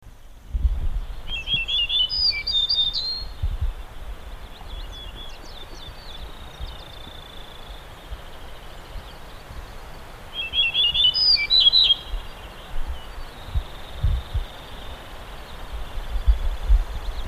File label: Yellow breasted bunting